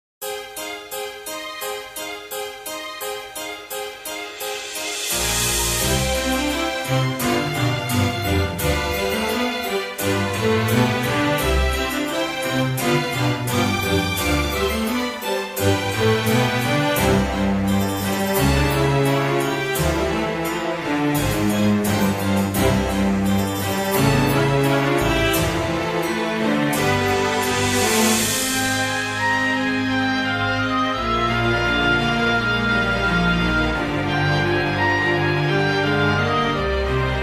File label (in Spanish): Ciudad Artejo Música